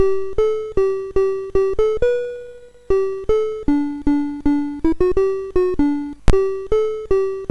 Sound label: C Prog Ref Guide example BASIC, Commodore MOS6581R2; DEMOS